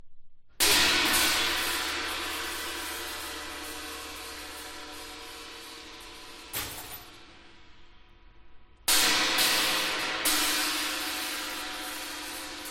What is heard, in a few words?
Percussion Recital